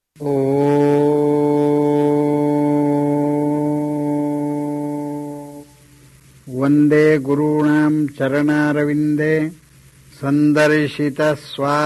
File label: opening mantra